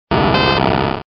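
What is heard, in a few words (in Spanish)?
"Grito de Magnemite", Pokémon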